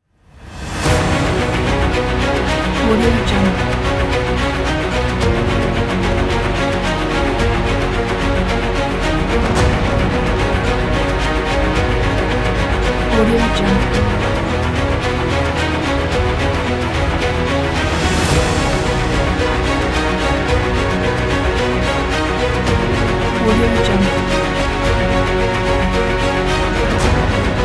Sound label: epic uplifting inspiring